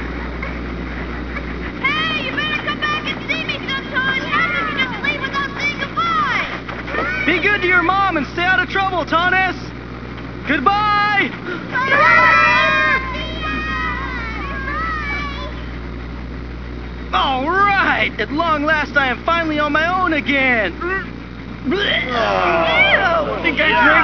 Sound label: pukey bye